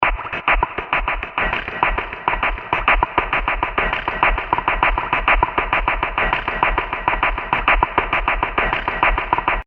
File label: 100 Bpm Underground Beats Loop; Royalty Free Drum Loops; Percussive And Rhythmic; Seamless loop; Andrew Fleming